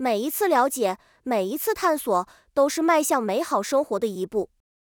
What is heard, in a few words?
ending